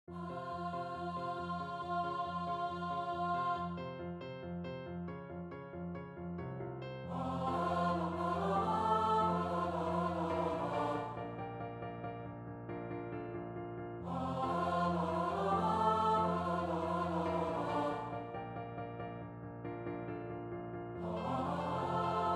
satb piano

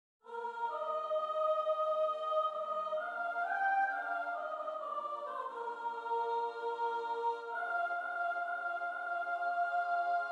as dew in aprille sops, choir

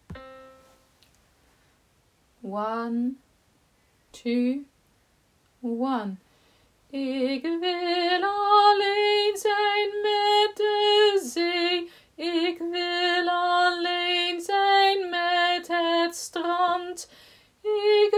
dutch song ten bass

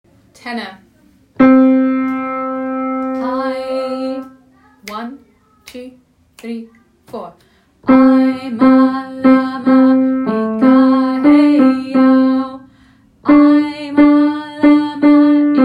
tenor only

